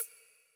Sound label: countup Loop End